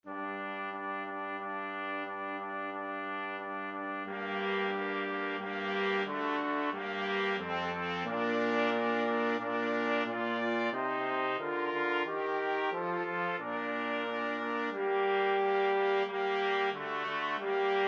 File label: pavane, 2 trumpets trombone